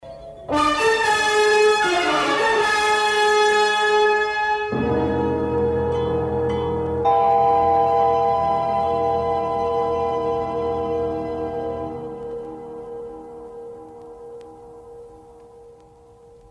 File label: ufo bgm